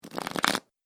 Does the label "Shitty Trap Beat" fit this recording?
No